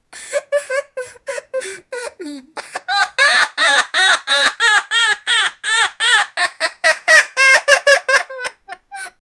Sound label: crying